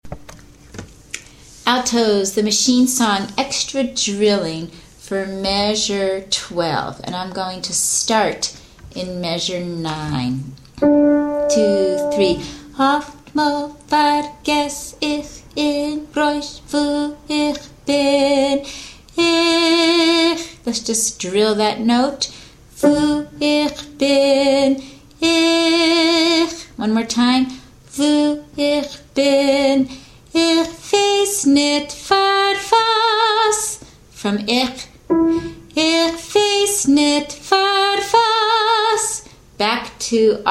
alto extra drill

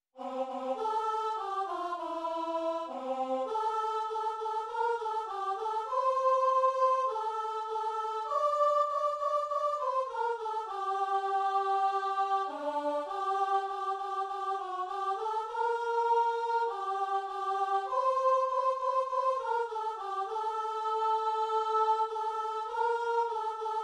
soprano; AR Himen; machine-generated

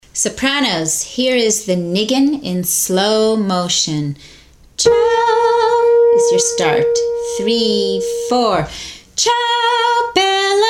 Soprano I Nign Slowly; Bella Ciao

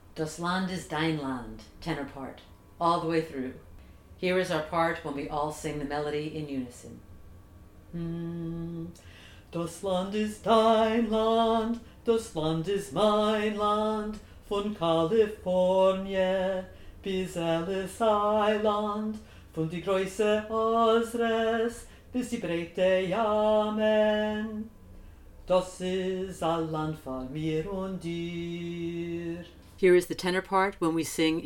tenor, chorus; all the way through; Dos Land

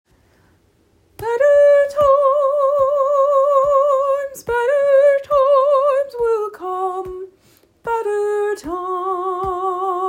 soprano; better times will come

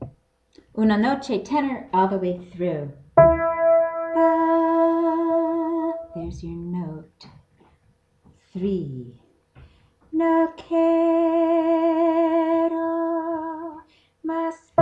tenor all the way through

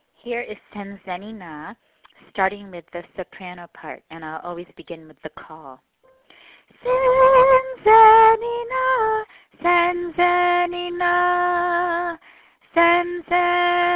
Soprano; Senzeni Na

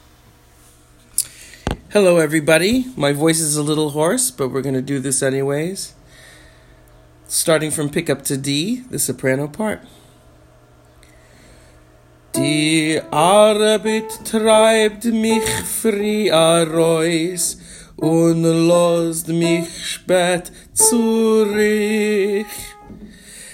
Soprano; Mayn Yingele; Soprano 1

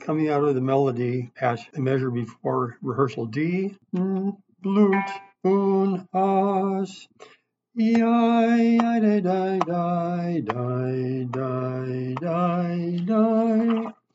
Bass nign; Playtem

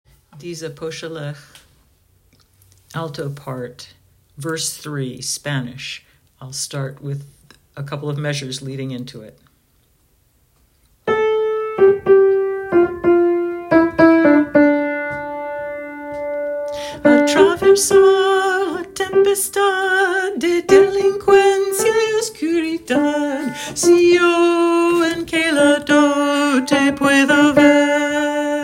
alto verse, chorus; more spanish practice; Di Sapozhkelekh; verse 3

